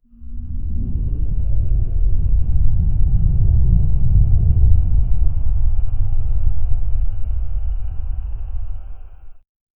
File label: the sound of a volcanic, eruption